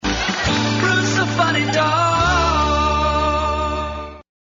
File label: Funny Dog Jingle